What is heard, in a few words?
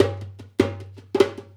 Dumbek; Essential Percussion